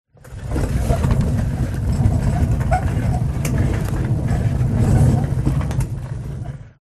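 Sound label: Rolling Janitor Cart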